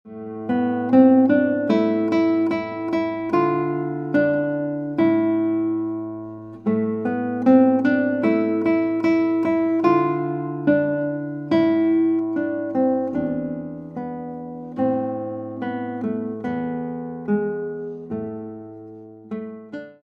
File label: Guitar